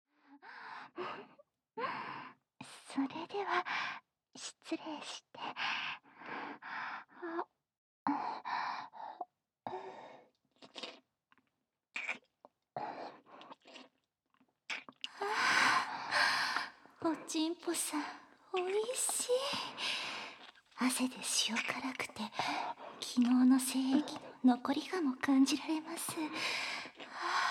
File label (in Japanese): 壁穴フェラチオ; 懺悔室の穴を使った壁穴フェラチオ♪